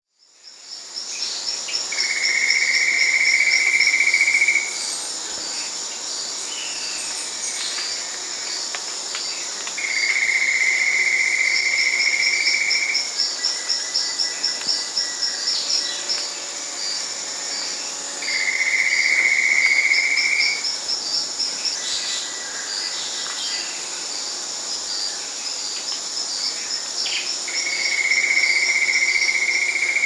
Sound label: Long-billed Gnatwren (Ramphocaenus melanurus), Peru; S. America